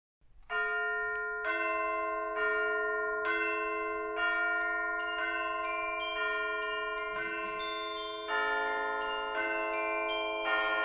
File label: Christmas Bells, High School; Niles North High School